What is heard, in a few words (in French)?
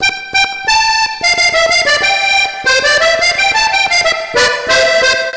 accordeon